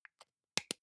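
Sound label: deodorant can lid close soft, badezimmer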